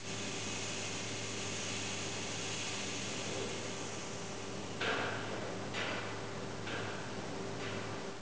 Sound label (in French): Machines Son